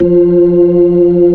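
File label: B-3, Hammond B-3 organ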